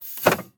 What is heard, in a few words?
Semi Truck Brake; Large Vehicles; Blastwave